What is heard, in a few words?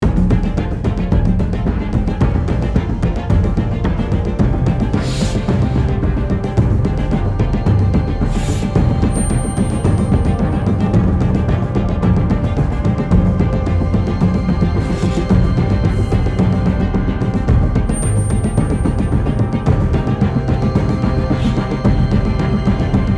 Tense (Loop); The Walking Dead: The Telltale Series